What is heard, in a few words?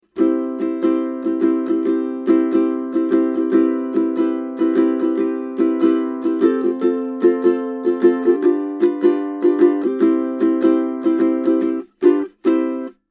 Ukulele 30cm; Sanken CU-51